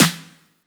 snare oneshot; Pop; Drums; SD PASADENA Pop Sample Pack; Slate

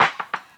perc, Drums, One Shots; oldy; Slate; AURORA Sample Pack

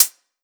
Closed Hat Tap, 909; Slate; Vintage Drum Machines Volume 3 909 Edition; 909 Closed Hats

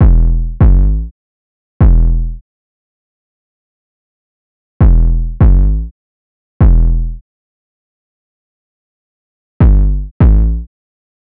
808 loop 100 Em; Slate Digital BASSHOLE Sample Pack

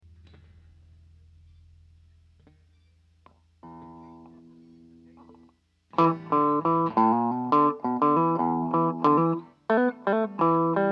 Strings

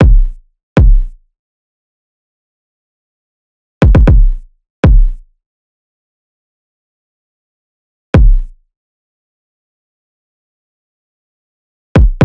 kick 118, Kick Loops; Drum Sample Pack; SD SEISMIC; Slate

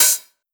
Open Hat, 909; Slate; Vintage Drum Machines Volume 3 909 Edition

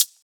hat spill, drum sample; Drum Sample Pack; One Shots; Slate; SEISMIC

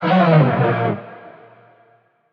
vocal B; chamber; Slate; AURORA Sample Pack; Vocals

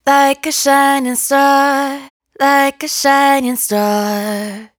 Dry Vocals hook Like A Shining Star 100 C#m; Raw Vocals; Slate Digital Omnivox Vocal Sample Pack

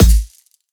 kick oneshot, Pop; slice; Slate; SD PASADENA Pop Sample Pack

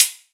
hat saucey, Open Hat, Drum One Shots; SD MIDNIGHT Sample Pack; Slate

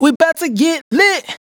hook, Dry Vocals; Raw Vocals; Slate Digital Omnivox Vocal Sample Pack

